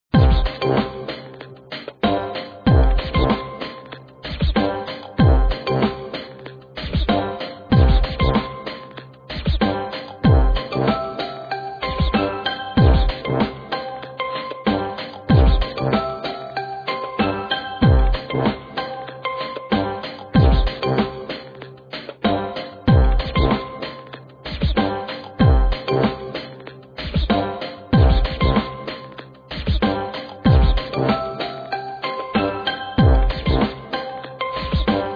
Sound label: soundtrack